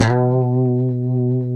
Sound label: B 2, COMPR STRAT, Guitars; Spectrasonics; Hans Zimmer Guitars VOL-1; 90's Sample CDs